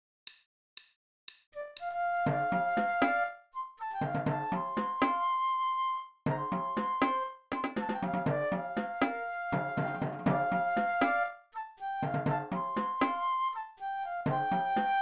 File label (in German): Quint Toms [120]